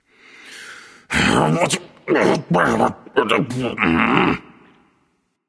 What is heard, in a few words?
grumbling; smite; voicepack